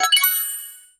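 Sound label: squaretooth chromakey synth, musical instrument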